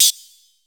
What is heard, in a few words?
HIHAT, DRUMS; Music Store Sound Service; Einstein